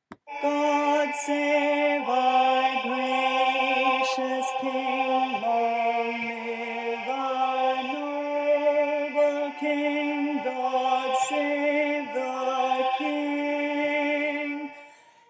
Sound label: tenor; God Save the King; Voices of Remembrance; Folk Choir music